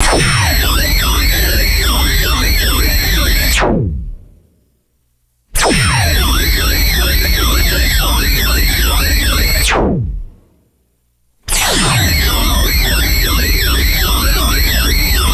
retro synthesized sci fi laser beam bursts; lasers

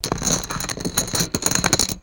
gentle shuffling of plastic poker chips; foley

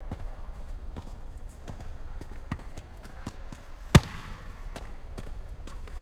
soccer ball kicked with footsteps; sports

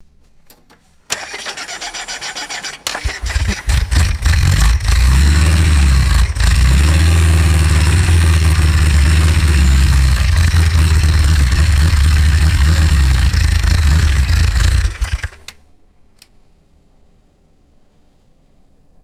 vintage bus engine starting and revving

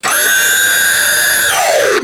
high pitched robotic servo motor whine

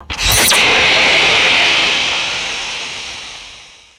small rocket ignition and hissing launch; aircraft

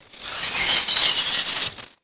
broom sweeping broken glass debris

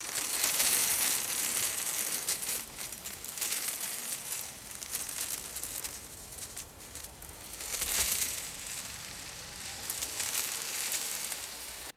dry leaves rustling in the wind; vegetation

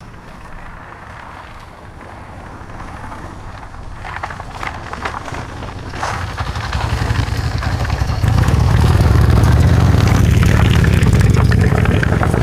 truck approaches and motorcycle passes by

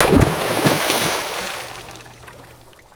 heavy water splash and bubbling

